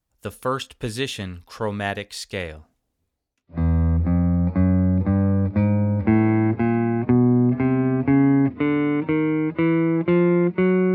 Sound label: Chromatic Scale